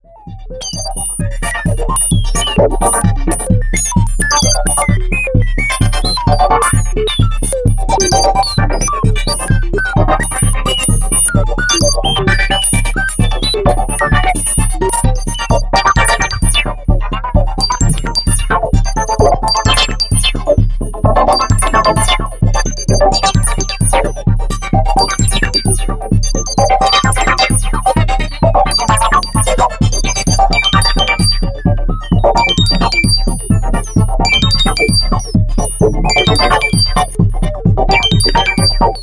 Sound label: Accordion Music; YourEye: Russia